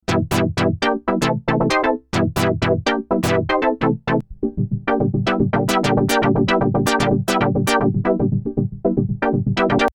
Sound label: chord memory